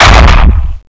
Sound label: foot crash; renesaur